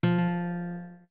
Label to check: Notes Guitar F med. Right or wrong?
Right